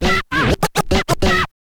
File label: scratch kit, vinyl